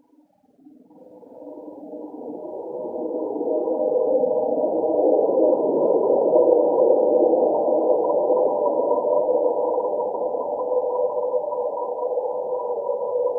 Grains High Dens, Chalice Well

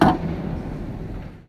Milano IR, Milano church; Milano church 1"; Infinity Room

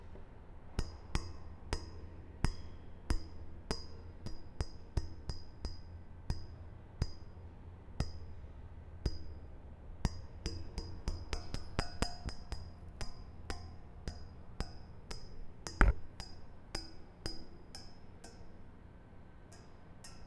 Metal pole tapping

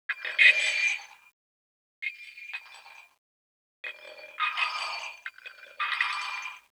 Glassbreak, Chalice Well

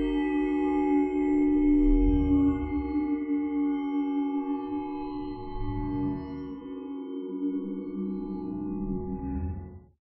pan

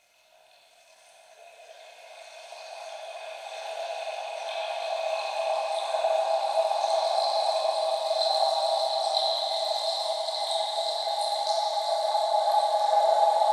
Well Water Stream

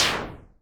boss hit

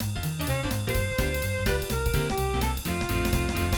stem medium high

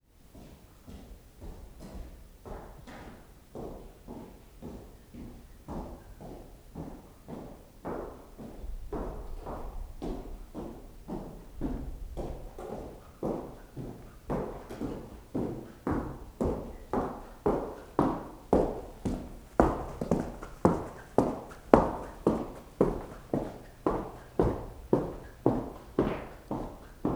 Reverb Footsteps

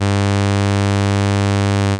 Sawtooth

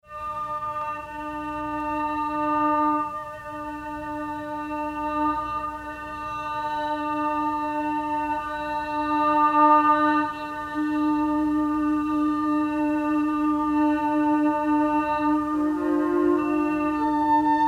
violin cue

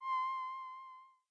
bubble

